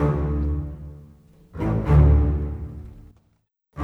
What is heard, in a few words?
Strings; Sonic Foundry (Sony Creative Software); Orchestral VOL-2; Modern 27